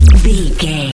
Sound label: sci-fi electric pulse hum